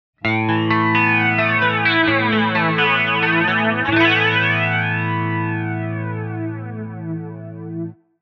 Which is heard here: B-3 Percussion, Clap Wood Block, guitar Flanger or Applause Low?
guitar Flanger